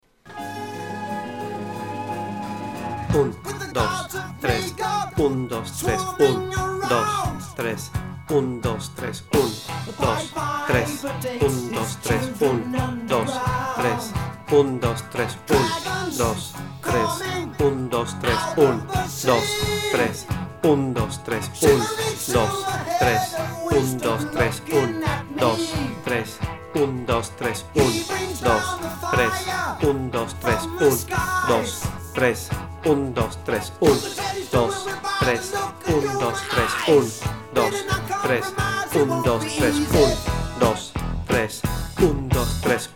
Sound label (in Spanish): solo de organo CON voiceover; Apocalipse